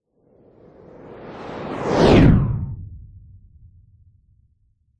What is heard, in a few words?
science fiction vehicles zoom by ultra sonic whoosh